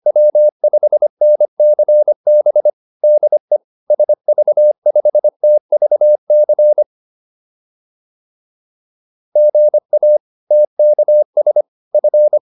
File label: QSO, advanced practice files